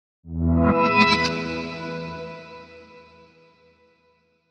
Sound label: ding